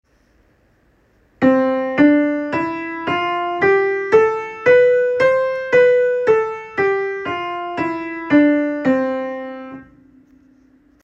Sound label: Major Scale Starting C; all-state-chorus